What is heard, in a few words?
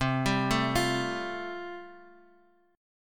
C 6th Suspended 4th, C; 6sus4:1,4,(5),6